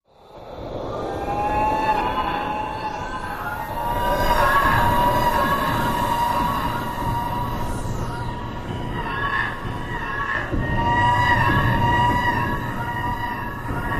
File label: Over Voice Med Alien Cry, horror